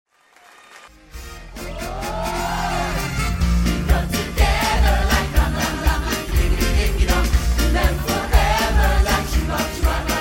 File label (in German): Applaus Musik; Grease